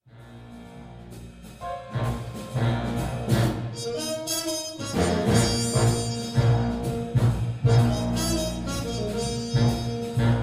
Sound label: blues